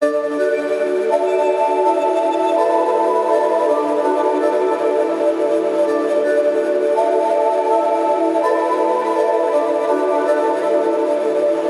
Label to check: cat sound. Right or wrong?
Wrong